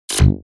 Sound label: weapons sci fi plasma cannon fire deep space